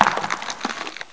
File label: Golem Die